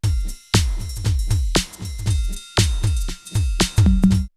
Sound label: R8, drumloops, DrumMachines; X-Static Goldmine; 90 s Sample CDs